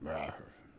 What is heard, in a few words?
Grunt; Civ4